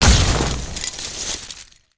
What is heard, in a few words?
earth smash; destructibles